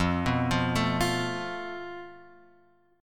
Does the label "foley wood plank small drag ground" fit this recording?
No